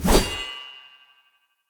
Long Sword Whoosh Heavy; Weapons; Player; Swords; Pothia

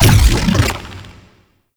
Octopede Lash Limbs Beam Stop; Warframe; ManInTheWall

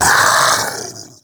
Vocal Pain, Infested; Splitter Split; Grey Strain

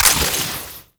Ammo Pickup Corrosive; Ammo Ability; Warframe; Warframes; Frumentarius

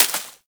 Bullet Impact Debris Grass

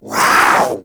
Kavat Vocal Attack Short; Warframe; Warframes; Khora

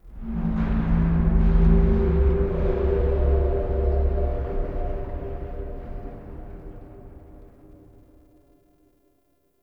Lfe Metallic Boom; Warframe; Entrati; Dante Unbound Leverian

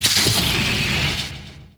Corpus Ship Servo Mech; Warframe; Corpus Ship Remaster

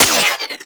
Shot B; Tenno; Prime Dual Soma

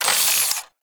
Sniper Zoom Out; Kahl

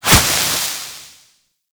Stadium Hazard Sparks Start; Gameplay; Warframe; Nineteen Ninety Nine

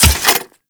Ghoul Crutch Step Dirt, Footsteps; Warframe; Grineer Ghouls; Ghoul Drill Sergeant; Foley

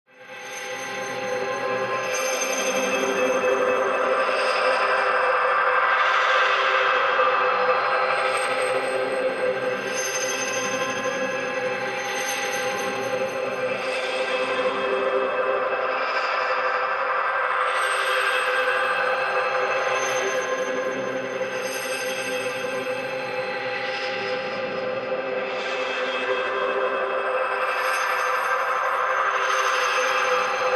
Reveal SFX; Lotus Reveal

